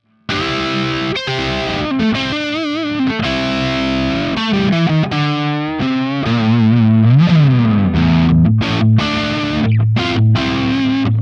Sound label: Harley Benton DC DLX Crunch Humbucker